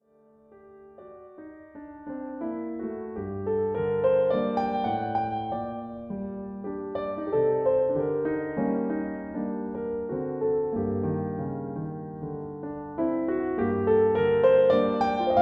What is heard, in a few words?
D4 Classical Rec AB Barcarolle